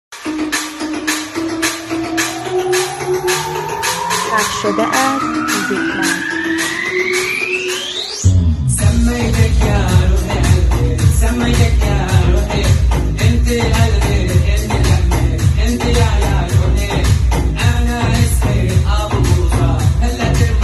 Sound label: happy turkish music